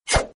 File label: slash; Power Rangers vs Teenage Mutant Ninja Turtles - Ultimate Hero Clash 2